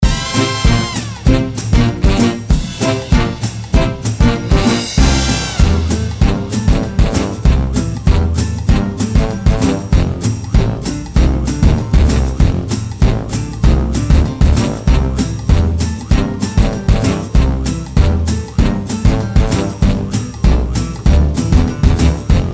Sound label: Brass Band Party